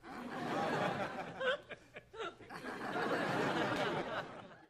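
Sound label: Crowd 100 Short Light Burst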